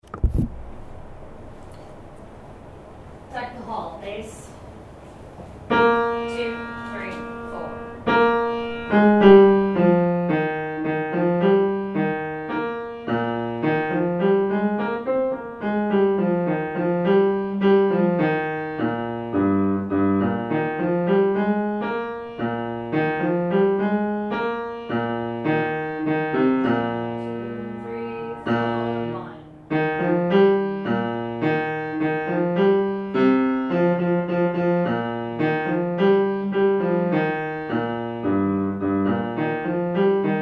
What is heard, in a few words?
Deck Bass